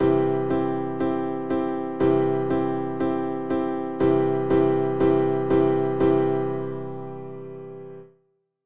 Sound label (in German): Akkorde, klavier